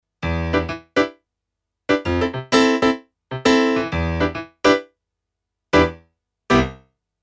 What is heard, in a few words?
Casio PX-S3100 Pop Piano